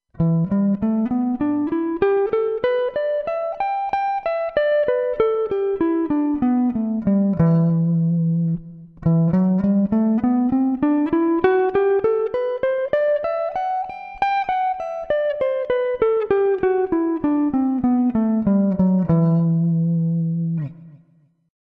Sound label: shred, guitar